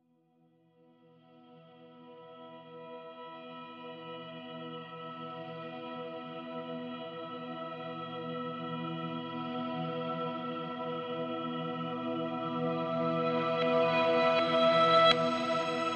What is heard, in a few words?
Pads and FX